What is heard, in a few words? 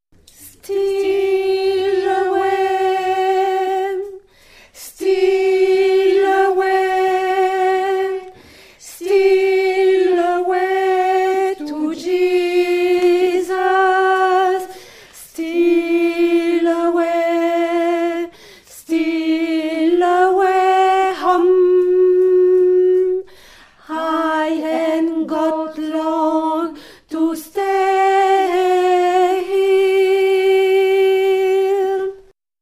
Steal away, Alto